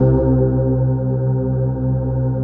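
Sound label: chord pad, Pads; Best Service; XX Large - Pads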